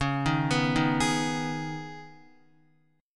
Db 7th Suspended 2nd Guitar, Db7sus2 chord; JGuitar

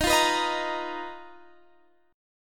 B Major 7th Flat 5th D sharp, chord shape

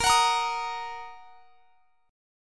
A sharp Suspended 4th Sharp 5th; A#sus4#5 strummed; x,x,20,23,23,23

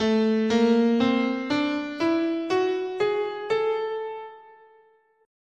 A Neapolitan Major, scale

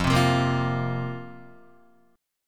F Suspended 4th, Guitar; strummed; chordshape

